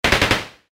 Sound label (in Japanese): アサルトライフル 4発; 武器効果音